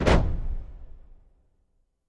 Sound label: Staff Hit Single, spears & staffs